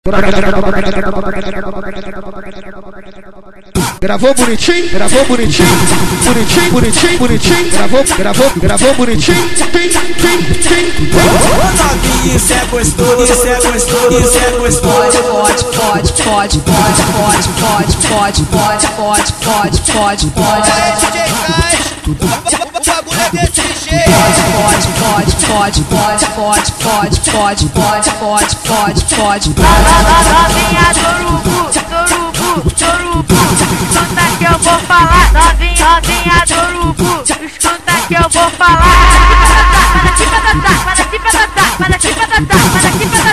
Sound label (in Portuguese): montagem